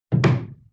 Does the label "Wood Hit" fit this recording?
Yes